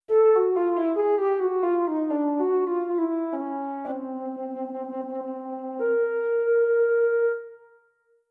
flute; undead overlord linux macos windows gamerip 2014

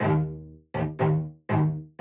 Cello

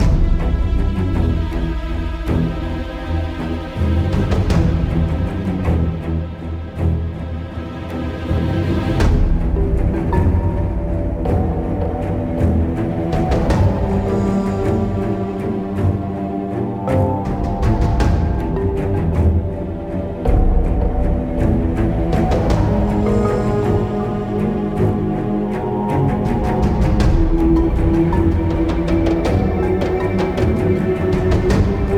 Boss Fight; Risen 2: Dark Waters

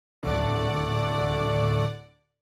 Perfect Percussion Success; Level 3

